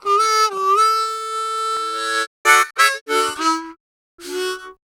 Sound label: Harm 098bpm A, Harmonica; Quazibeat - African Secret